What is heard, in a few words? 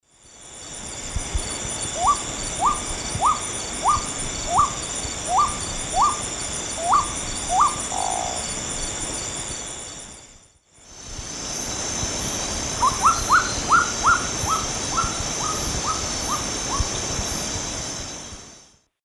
Nyctidromus albicollis, calls; S. America; Eastern Lowlands